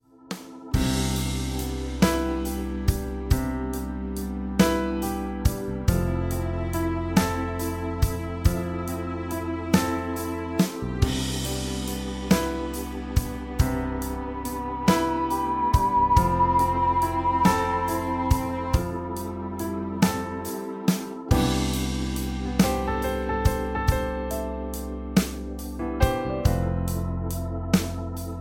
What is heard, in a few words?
Trap